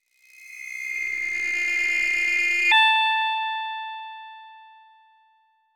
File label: synth sound like a scream; sound effect